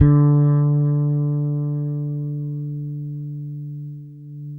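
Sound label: 6-string fretless bass; Spectrasonics; Bass Legends; 6-STR FRETLS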